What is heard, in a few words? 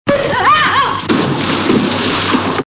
curly funny noise; Curly Makes A Funny Noise When He Gets Hit In The Head And Falls Out Of The Boat